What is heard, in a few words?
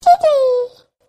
click; monstergo; pokebot